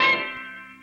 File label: Warble Bell (Single Note); Sound FX